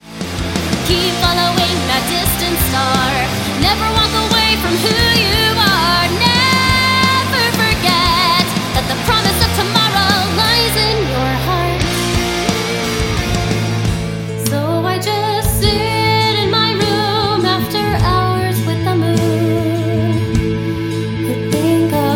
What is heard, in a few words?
Singing